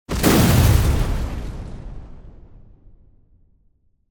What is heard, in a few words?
Explosion Heavy Glass Destruction - Short; Hollywood Edge; sound designer's toolkit v2